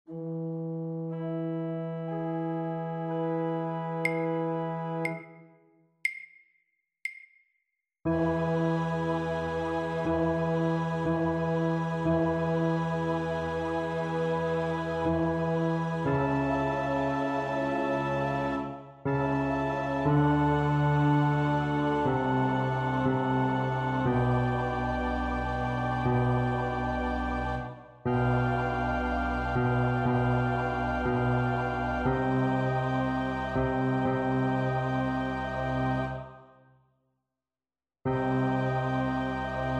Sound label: Et incarnatus Bass; 2. Et incarnatus est (Bass)